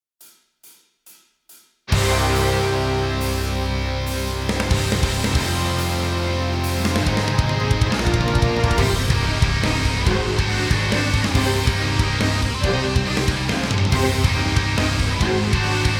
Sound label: backing track; G.O.D. COMPETITION素材